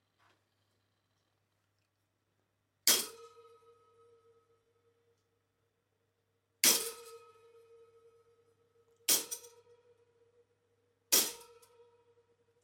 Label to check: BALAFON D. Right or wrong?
Wrong